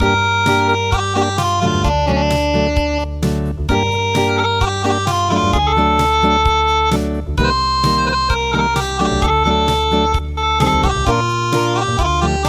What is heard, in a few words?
chirp chirp chirp Acoustic